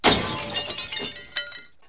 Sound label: delicate, destruct; Wired for Sound Pro CD; Aristosoft, Inc.